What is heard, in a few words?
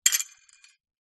household plate broken piece small drop down on larger piece